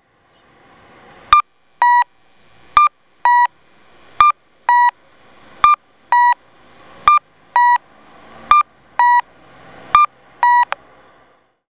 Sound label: koito kakko, traffic signal; MP-I-2; onkyo kosaten; okayama; minami; namikicho 1chome